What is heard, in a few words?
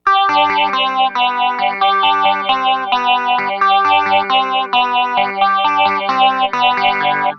ringy gtr tcxii re